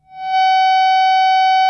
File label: GTR, GUITAR FX